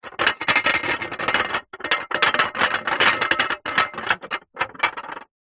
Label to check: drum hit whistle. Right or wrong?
Wrong